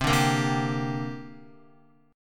C 7th Suspended 2nd, Guitar; C7sus2 strummed; JGuitar

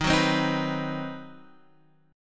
C Minor Major 7th Flat 5th D sharp, chord

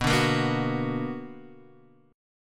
B Minor Major 7th, BmM7 chord; strummed; JGuitar

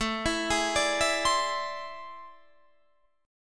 A Minor Major 11th, down, Guitar, Standard; arpeggiated; AmM11; JGuitar

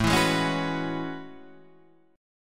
A Major 13th, Guitar DADGAD

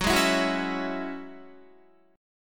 Gb 7th Suspended 2nd Gb Guitar; Gb7sus2; strummed

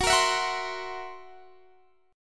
Fsharp 7th Flat 5th down Guitar Standard

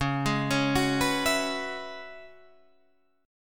Db Minor 11th, Guitar, Drop D

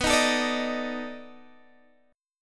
B 7th Sharp 9th B down Guitar Standard; B7#9 strummed; chord shape; JGuitar

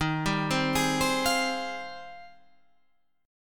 Ab Major 13th Eb

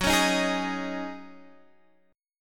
Ab 7th Suspended 4th Gb

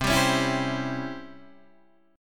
C sharp Minor Major 7th Flat 5th C, chord

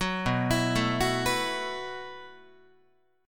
B 7th Suspended 4th B down Guitar; B7sus4; arpeggiated

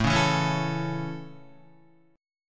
A Major, Guitar; strummed

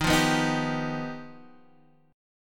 G Augmented 7th

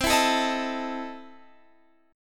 E Major 7th Suspended 4th Sharp 5th, C

